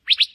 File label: Curve billed thrasher call